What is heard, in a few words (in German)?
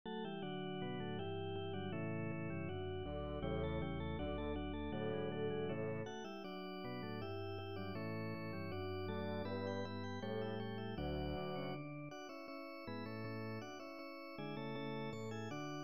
postlude orgel; knecht justin heinrich; vollsta ndige orgelschule